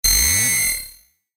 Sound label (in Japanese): ロボット 起動音